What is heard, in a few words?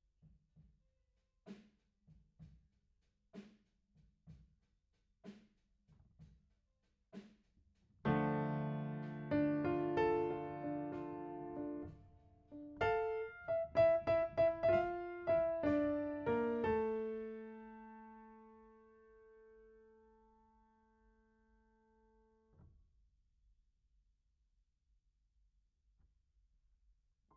Piano A